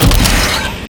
serious bomb ammo; serioussam2

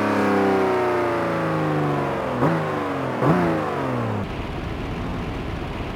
throttle off, vehicles; sgmcars; modelt

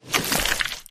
human form short move; flood

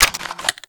carbine clip out; weapons

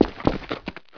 wallrun

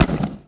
explosion small

